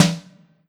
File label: Yamaha FS1r Drum Kit Snare